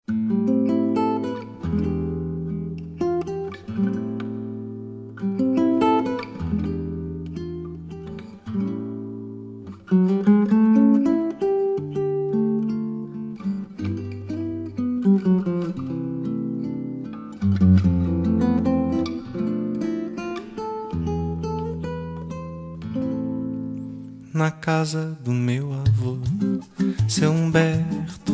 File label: Brazilian Cafe